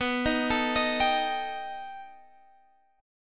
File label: B Augmented 7th B